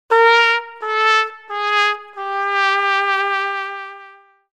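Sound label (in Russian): неудача провал вариант труба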